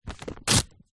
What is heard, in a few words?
paper rip thin magazine page; foley